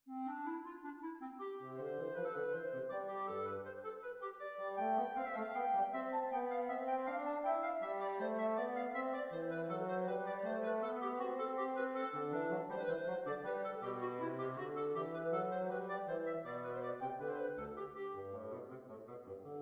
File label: Invention, woodwind; Johann Sebastian Bach; Invention No. 1; BWV 772; woodwind duet